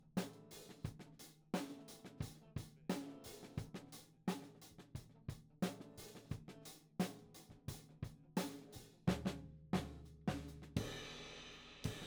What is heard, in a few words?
Tom1